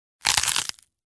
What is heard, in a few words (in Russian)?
hrust cherepa mumii